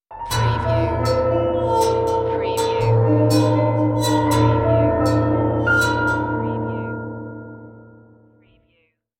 Dark Production Stinger

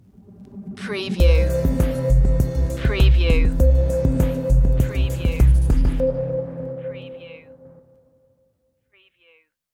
underwater pulse stinger

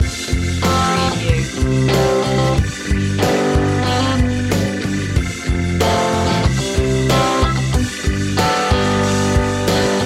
flower rock loop

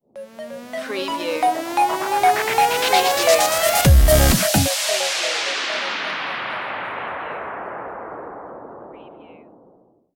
crystal rain stinger

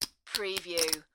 Poker Chips Stacking

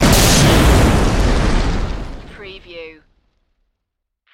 EXPLOSION CAR VEHICLE

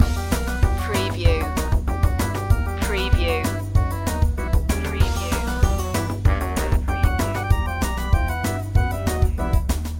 the spooky pumpkin head loop

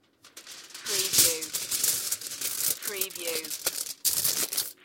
Metal foil, aluminium foil crumble

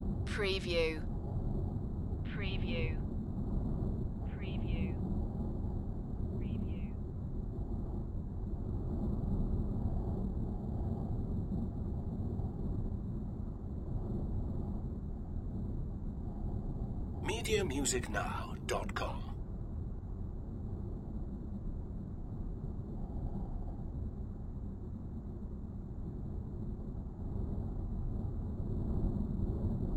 Strong Coastal Wind; beanstalk audio

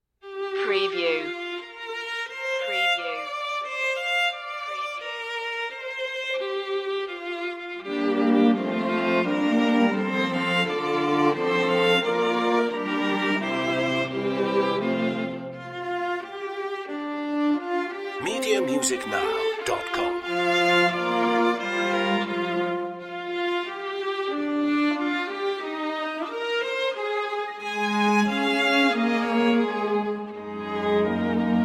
string quartet; mussorgsky; pictures at an exhibition 1 promenade

